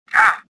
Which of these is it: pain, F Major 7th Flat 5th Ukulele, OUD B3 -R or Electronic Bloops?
pain